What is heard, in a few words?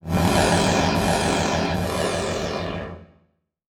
Title Transition Blast Energy; Production Element Title Transition